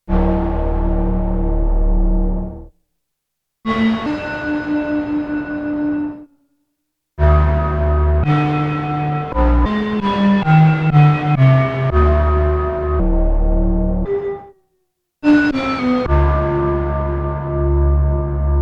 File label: SPACE GOHST, Korg DW-8000; Factory Patches